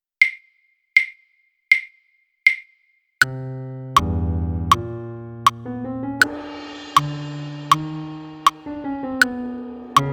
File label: piano cymbal click